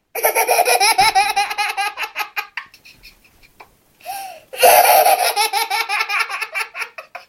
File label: Example exhalation 18 months